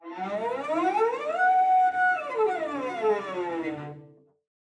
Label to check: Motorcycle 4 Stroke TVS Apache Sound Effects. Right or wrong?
Wrong